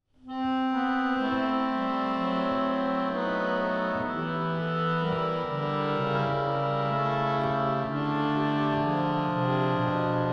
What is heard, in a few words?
harmonium; hudba